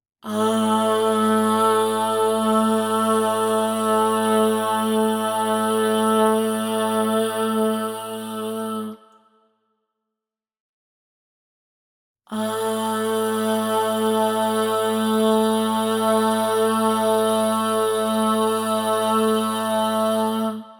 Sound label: Ahh 432 hz; MixterPlus